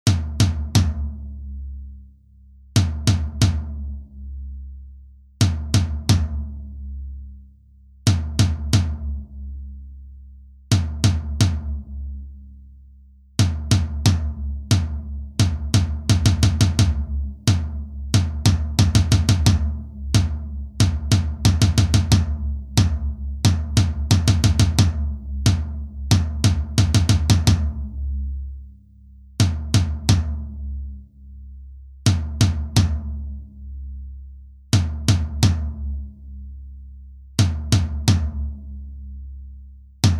BASE SAMBA REGGAE

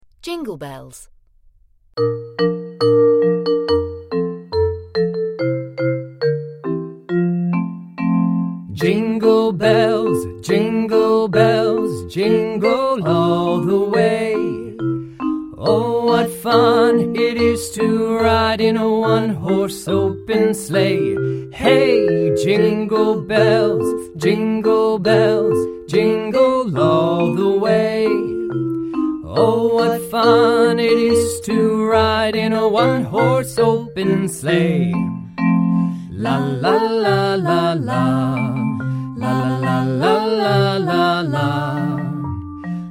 jingle bells

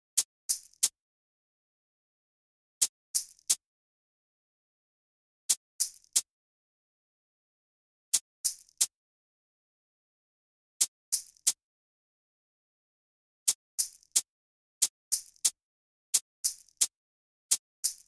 SHAKERS SAMBA REGGAE

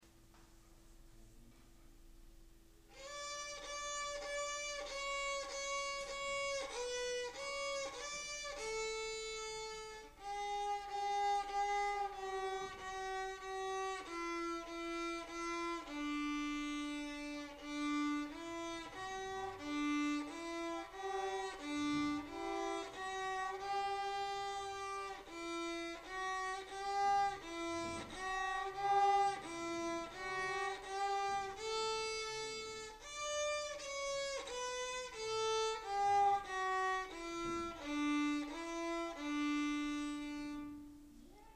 viola; Música al Saavedra

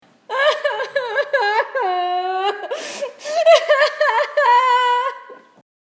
crying; Senses vocabulary

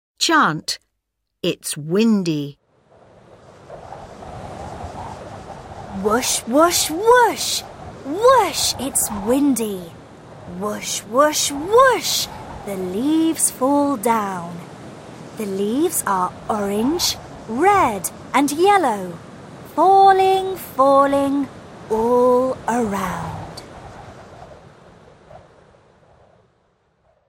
Its windy chant

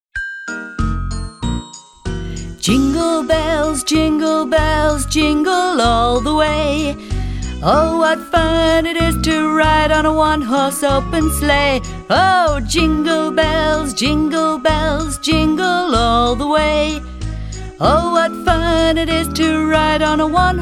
Jingle bells Song

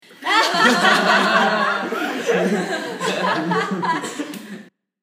laugh; Senses vocabulary

